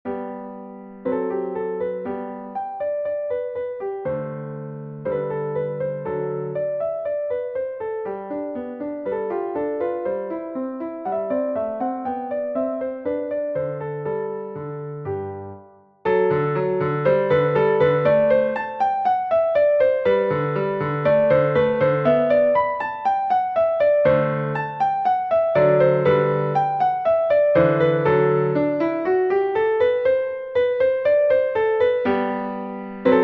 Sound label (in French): sonatine; beethoven ludwig van